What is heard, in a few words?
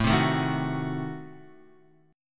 A Diminished 7th A down Guitar